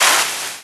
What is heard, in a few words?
Slide; Link; zelda sounds